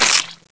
smash, roach; cstrike

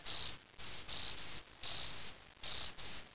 cricket

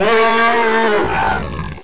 garg die; svencoop; babygarg

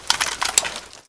sniper deploy, weapons

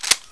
colt clip in, weapons; dod

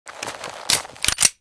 pistol reload; cstrike

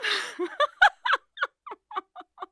crying laugh; svencoop

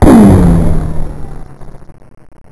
ric, debris

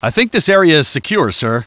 landing area peace; gearbox; gunman

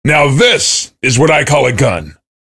cheer; svencoop; voice commands; SeriousSam

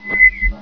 alert; audiotracking

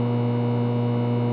generator hum

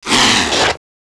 claw attack; rheptor

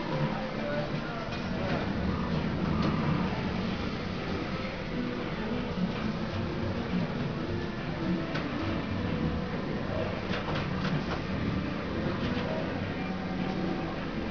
arcade

